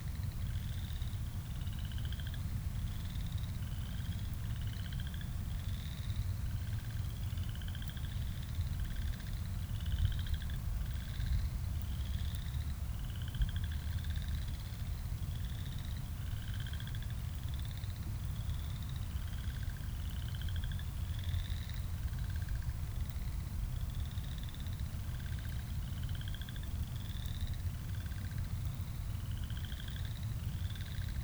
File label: Chorus Frog Multiple Individuals